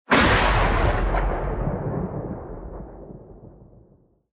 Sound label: lightning Blast; Champions of Valhalla